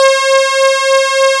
BRASS

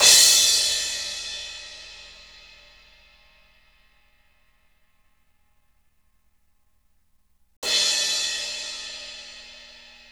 Roland MV8800 DRM; Sliced

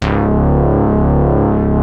JUNO 60 G2; Hollywood Edge - Giorgio Moroder Rare Synthesizer Collection

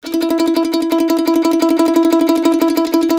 C E trill E, Blues Guitar

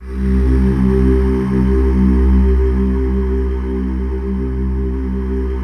choir, synthesizer; TS 10 MIST

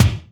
Techno Kick, Alesis Hr16b, Drums

